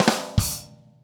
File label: Joke Sting